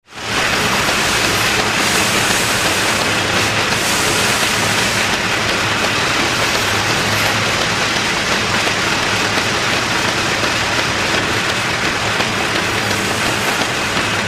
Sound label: Factory Background